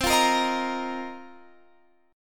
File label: F 7th Suspended 4th C